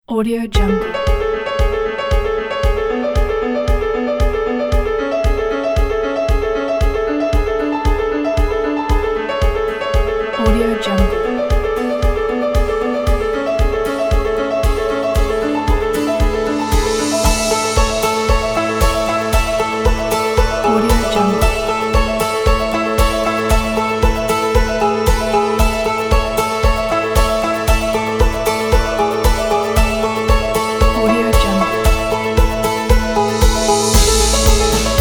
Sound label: motivational inspiring piano